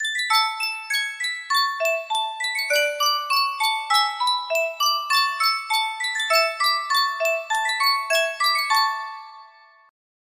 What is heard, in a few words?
Sankyo miniature music box